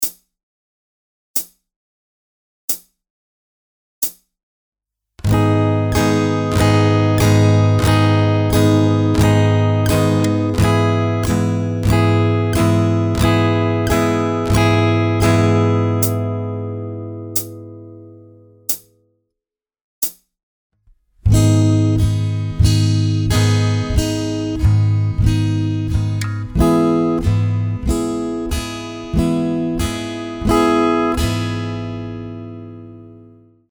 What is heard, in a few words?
Index Vs. Thumb capo 4 (SLOW), strumming; Ultimate guide to strumming book